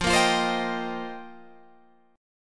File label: F 7th Suspended 2nd Suspended 4th, Guitar Open G